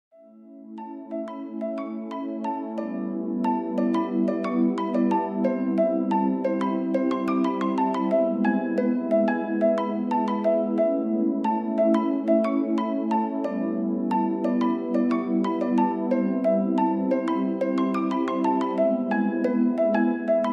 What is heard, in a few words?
Ocean Nighttime